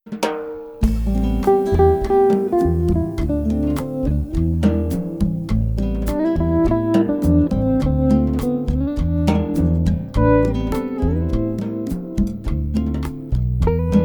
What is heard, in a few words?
Cafe Latino